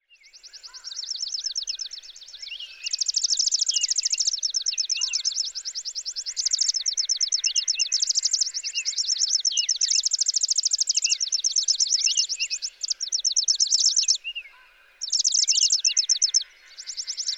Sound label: Chipping Sparrow